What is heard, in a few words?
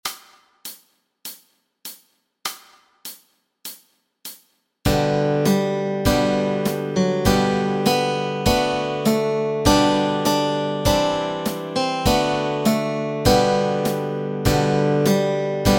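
melody and chords, Easy Guitar and Ukulele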